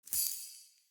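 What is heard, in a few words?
Staff Caster Projectile Destroy; Weapons; Player; Staves; Starter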